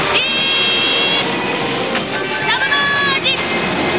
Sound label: deep submerge